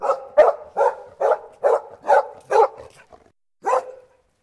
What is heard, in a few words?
dog distant; DeadFright